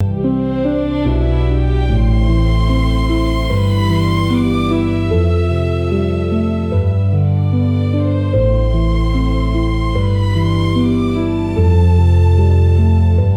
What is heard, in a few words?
loop